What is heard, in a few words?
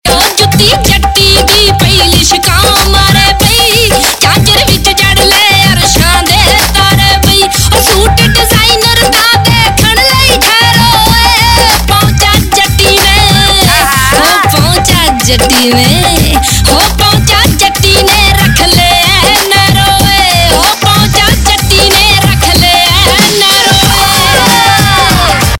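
Ringtone, Punjabi Pop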